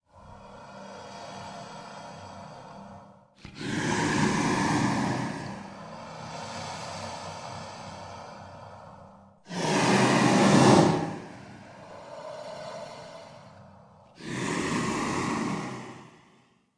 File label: breathing